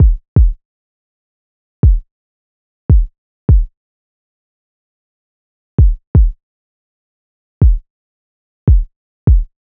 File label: Crunch Drum Loop 83 BPM Kick, Lofi; Cymatics - ANALOG EVOLUTION Beta